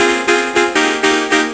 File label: HardChords; Jungle; Riffs, Arps & Hits; Blu Mar Ten - Jungle Jungle - 1989 to 1999 Samplepack